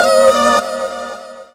Ambi Cry; Zero-G Jungle Warfare 1, 2 & 3; 90 s Sample CDs

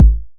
909 BD; Goldbaby Tape808 & Tape909; The Tape 909

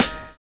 FUNK; Univers Sons - Kitch 70s

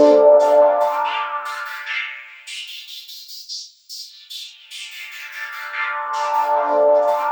FIZZY PULS, Synth FX Misc

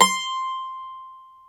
mandolin

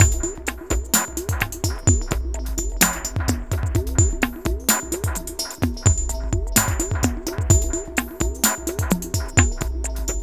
Ethnotronic, Processed Percussion

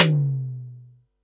tom, Pearl SC-40 Toms

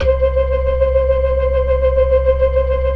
36 BLOOD R 1, ORGAN; Keith Emerson Signature Series

